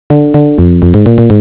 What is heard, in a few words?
tune